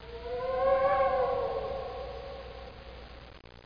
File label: Wierd